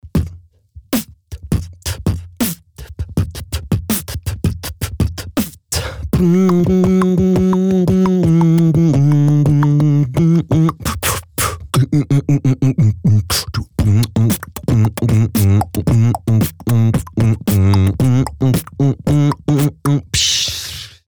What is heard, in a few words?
Beatboxing